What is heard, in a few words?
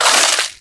glass, footsteps